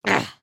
Run; creatures organic; Lego; Strombie

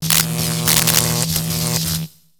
Robot Electro Arcs; Lego; creatures mechanical

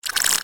Broombot Sm Whirl mad, creatures mechanical; Inventor Broombot

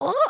stretch, emotes

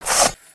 removal; creatures organic

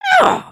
drink

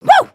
cheer

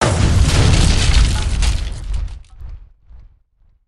Bomb Crate; destructibles

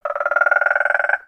Pets Tortoise Happy; creatures; Lego

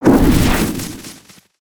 Knight Lunge Explosion; Valiant; aronwk collection; ndaudio

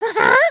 excited, emotes

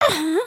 camera picture

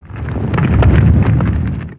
Spinjitsu Earth One shot

